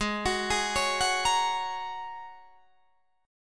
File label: G sharp 7th Suspended 2nd Suspended 4th G sharp Guitar DADGAD